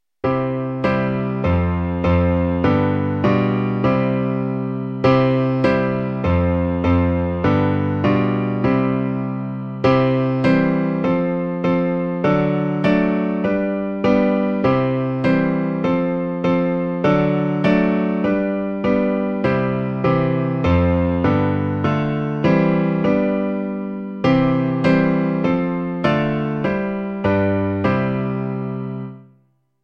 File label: i am jesus little lamb accompaniment